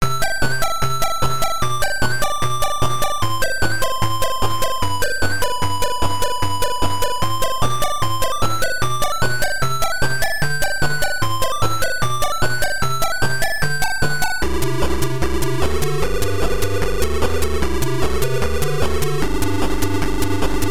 Groops High Score; Binary Sciences

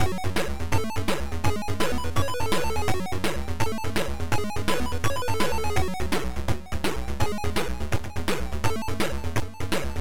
Level 1; Galactic Tomb; ESP Soft; John McKlain; StArkos

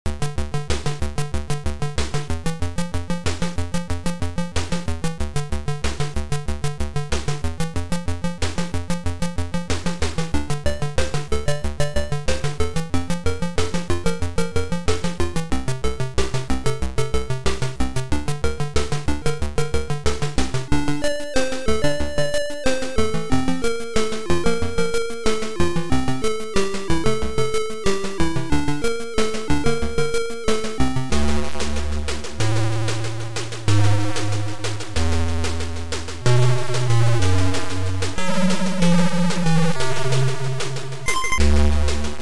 Menu; Electric Fanzine 2; Music Pro

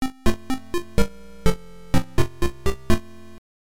Groops Success; Binary Sciences

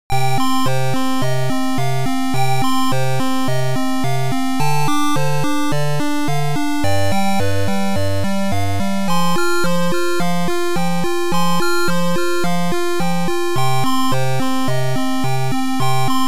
Game Over; Infernal House; Lankhor

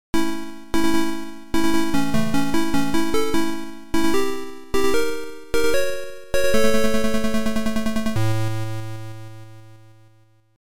HighScore; Classic Invaders; Bubble Bus Software

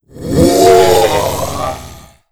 die; lucifer

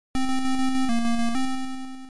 Game Over; Sos Music; Amstrad Cent Pour Cent

